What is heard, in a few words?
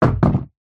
household chair patio plastic down on ground